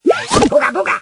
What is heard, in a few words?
blowdart deploy